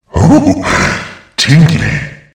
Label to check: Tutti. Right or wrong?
Wrong